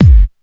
tight low end bass drum sound g sharp key

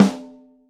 reverb acoustic snare g key

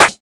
00s dirty steel snare drum g sharp key

